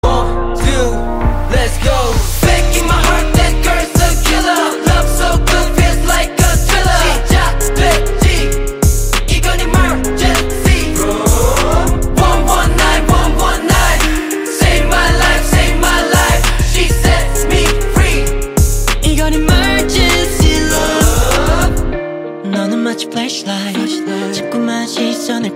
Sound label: kpop